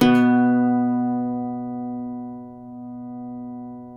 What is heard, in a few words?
cuatro; Spectrasonics; Hans Zimmer Guitars VOL-2